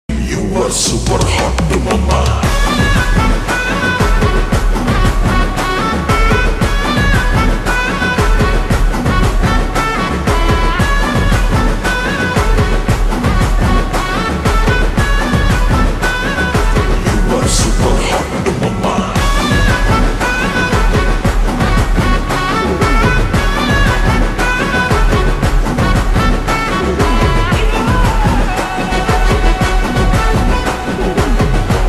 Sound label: Ringtone Slowed Reverb, Kannada; Max